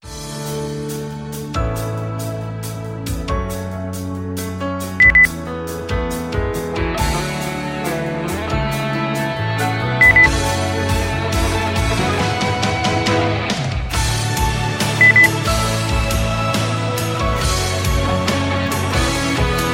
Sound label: pop